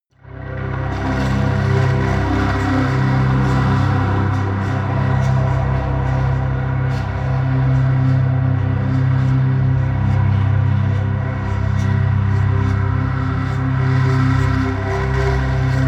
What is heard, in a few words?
pad drone crumble; flaechen